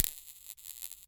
Bug zapper zapping, electricity, crackle; Druid Magic; Rogue Waves

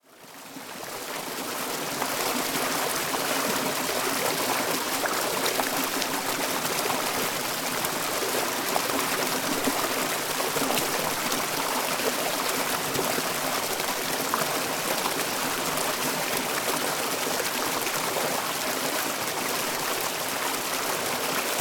Turbulent water flow (close perspective)

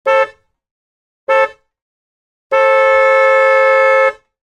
Audi Q7 EXTERIOR Horn Short And Long MONO

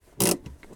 Polaris RZR Parking Brake Behind Drivers Seat; Polaris RZR XP 1000 EPS 2017; DPA4062; Pole Position

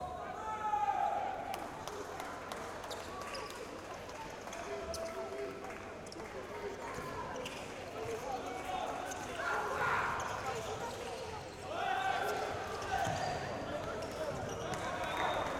Masculine League Match, Handball Match Ambience

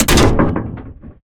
industrial lever switch; BluezoneCorp

